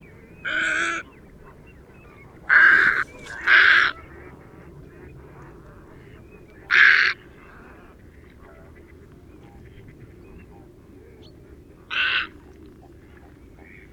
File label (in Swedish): Silkeshager